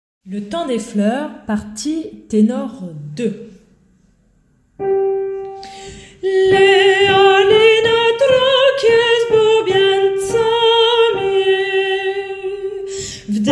partie tenor

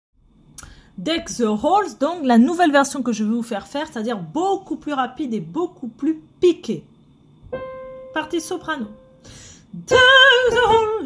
soprano nouveau tempo

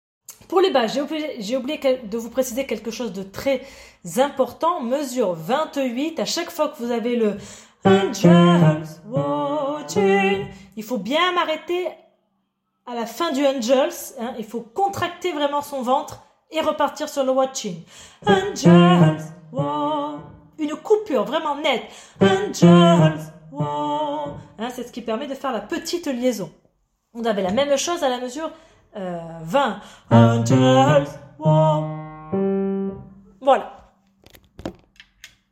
basse

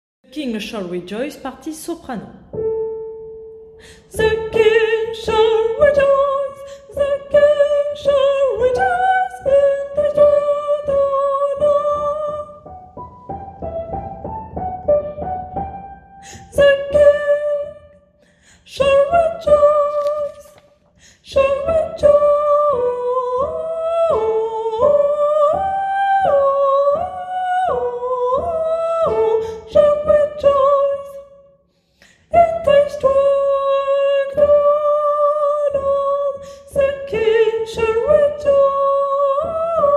partie soprano

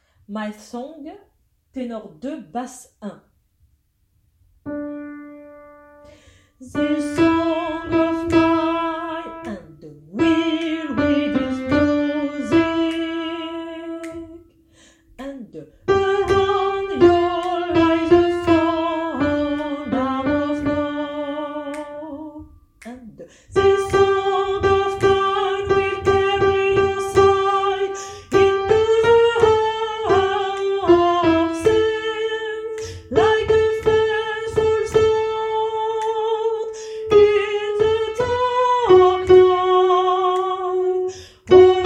tenor 2 basse 1

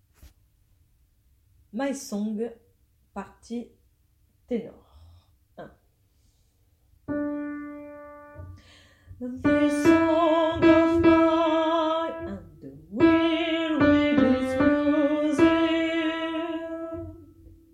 ténor

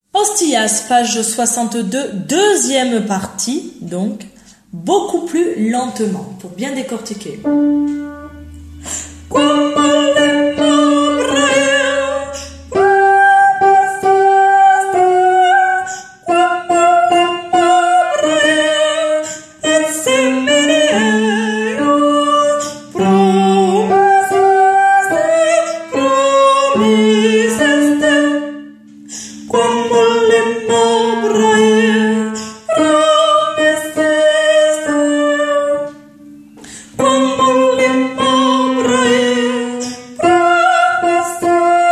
Tenor lent; Requiem; Hostias; Mozart

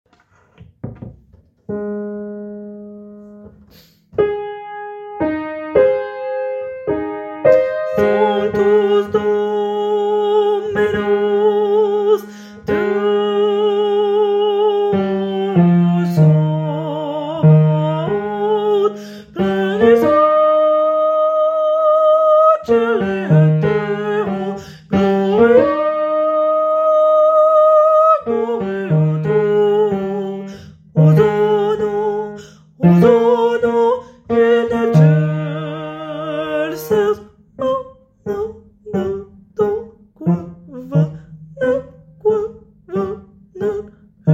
basse

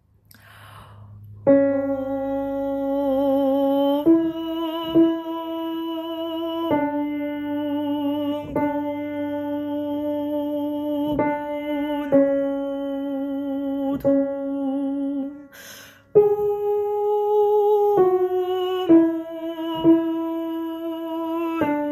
alto du bas

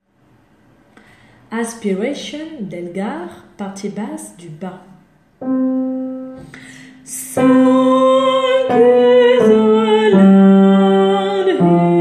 Basse bas; Aspiration

